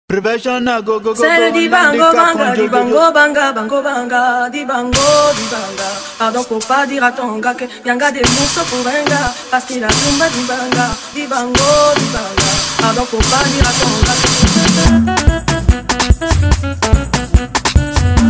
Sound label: Professional Beat